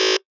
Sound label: Error